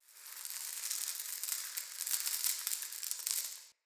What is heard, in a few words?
Cave Dirt Trickle